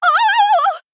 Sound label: Female Human Death